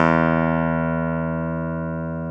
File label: KEY X Hohner Clavinet